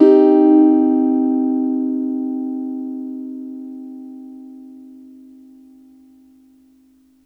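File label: Piano Clav minor soft; TrashLibrary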